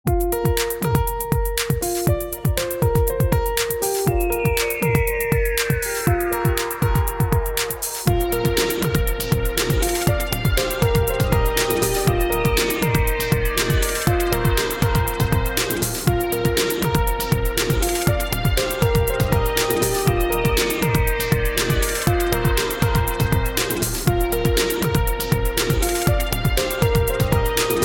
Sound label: Chillzone Lobby Music